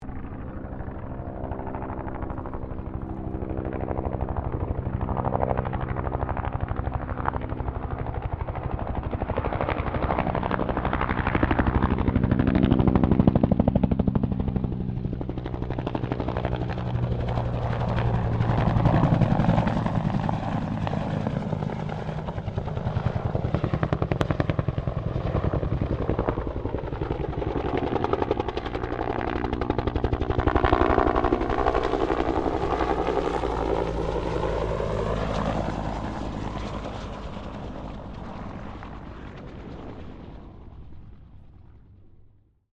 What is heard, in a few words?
HELICOPTER 1967 BELL UH1 IROQUIOS PASS